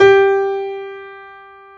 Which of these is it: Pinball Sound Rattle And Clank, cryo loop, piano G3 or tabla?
piano G3